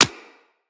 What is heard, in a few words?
Bullet Impact Generic